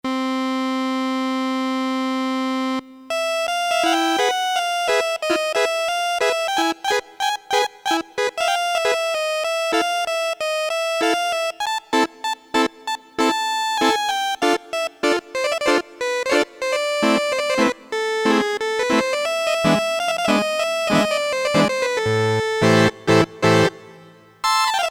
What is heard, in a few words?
Drawn, Korg DSS-1, Created Waveforms